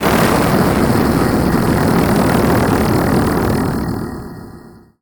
Static; Blastwave

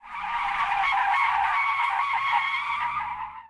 skid asphalt; Blind Drive

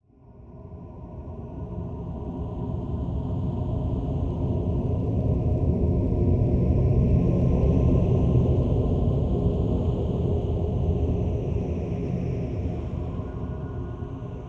Mansion Mood Amb; Pitch Black Audio Pong

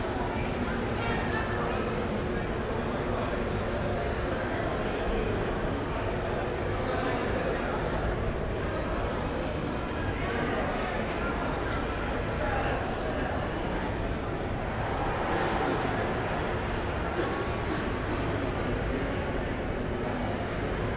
Urban people babble crowded; urban and suburban; boom library

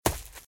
Footsteps - Leaves - Run - Left; RadwinIsland

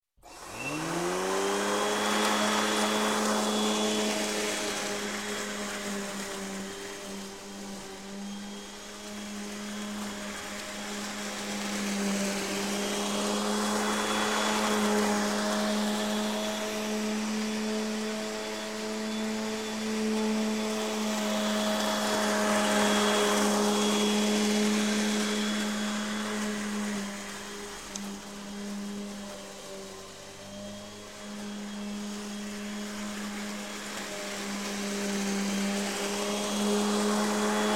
Lawn Mower Electric Start, Cut, Stop, Gardening; Sound Ideas; Series 2000

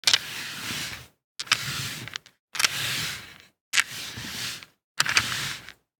DICE on Felt, Slide, Standard, Five Dice; Samples; ArticulatedSounds; DICE Sfx Party (Extended)

